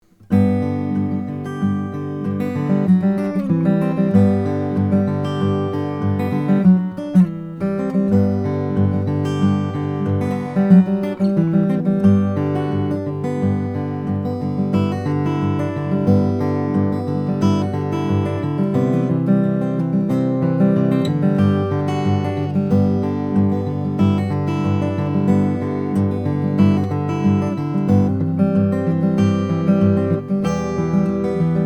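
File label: Bourgeois acoustic guitar; luthiers choice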